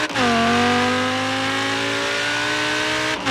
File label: third, vehicles, audi v10; autos; skyautos